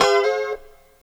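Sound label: G 4 B mono slide R, guitars; Spectrasonics; Hans Zimmer Guitars VOL-1; 90's Sample CDs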